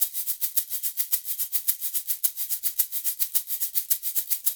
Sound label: LOOP L, Percussion; InVision Interactive; Percussion Slam; PROGFROG